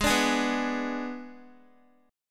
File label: Ab Diminished Ab Guitar; Abdim strummed; JGuitar